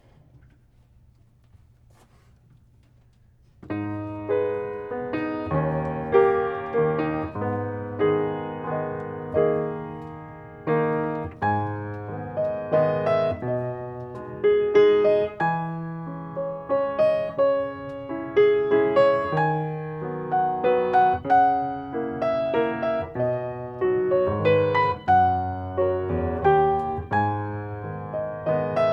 Gm waltz 30bpm; Brahms

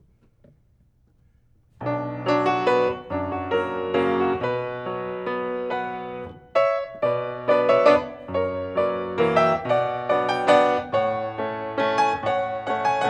waltz Bm 50bpm moderato; Brahms

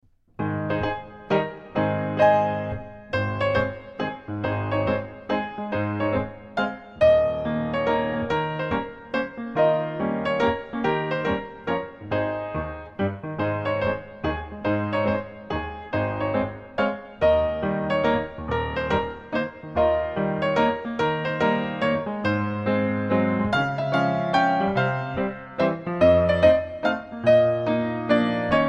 opera mazurka; Tambutti

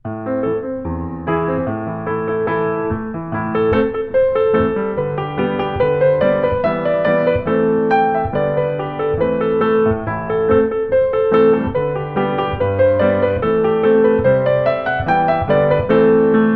hornpipe; humors of schull

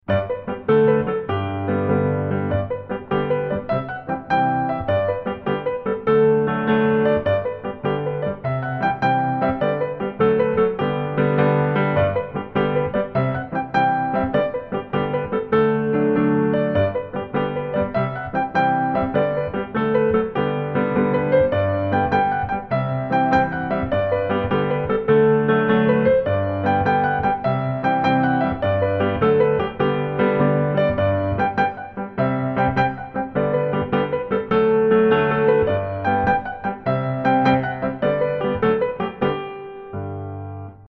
jig gigue

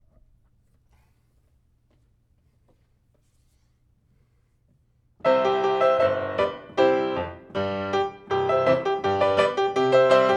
C polka longer; Smetana

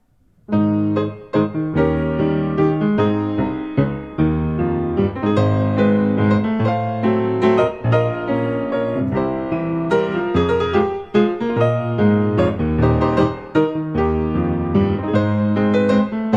Ab waltz short

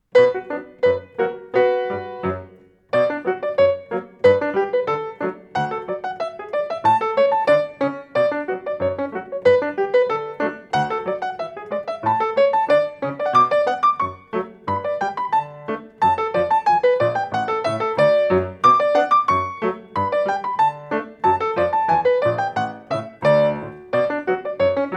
Bm Ecossaise 90bpm; Schubert

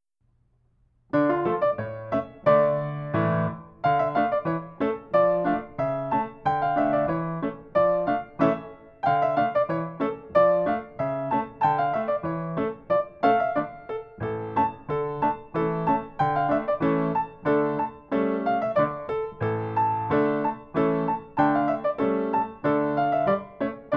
Russian folk Dm

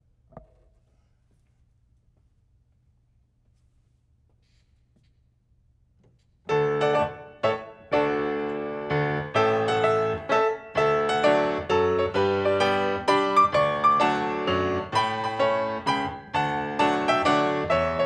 mazurka; Coppelia

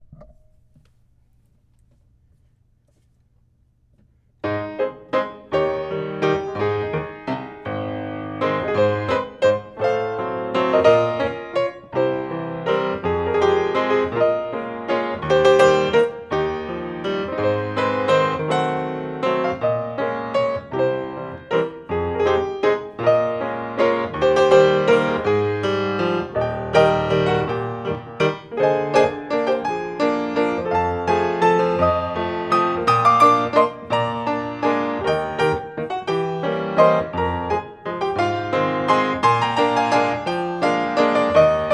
Ab waltz 32bar bright

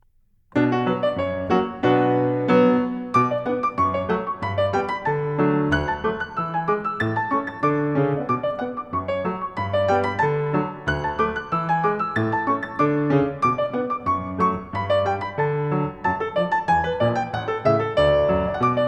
schub Bm 120bpm

